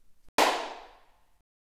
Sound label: garage clap minus 100ms